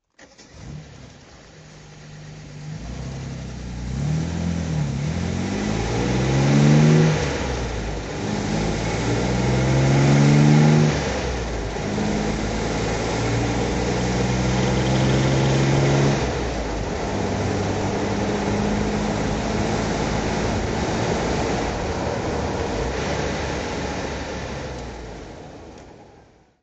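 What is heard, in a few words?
jaguar xk150 34 drive, Engine sound driving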